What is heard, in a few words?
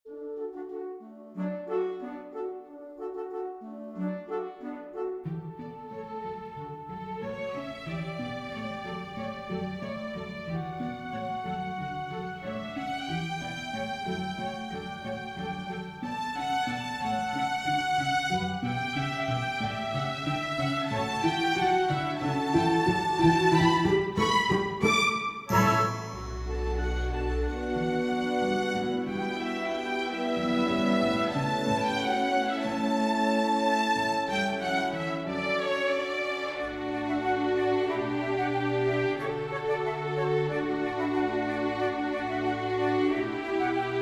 nutcracker inspired, orchestral